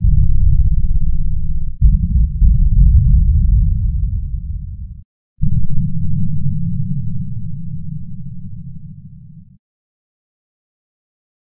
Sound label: Percussion; Instruments